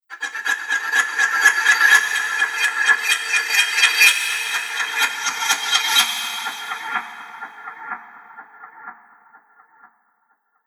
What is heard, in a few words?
Horror Movie Sharp Metal Transition; Scary Soundscape Sound Effects